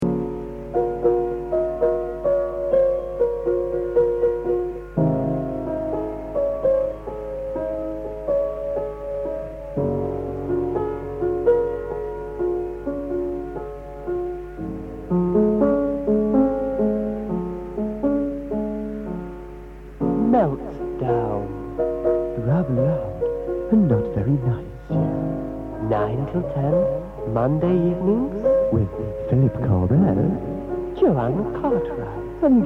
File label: Jingle